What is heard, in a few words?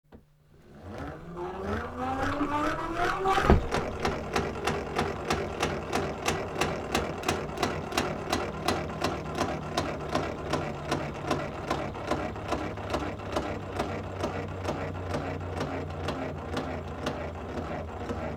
Grinder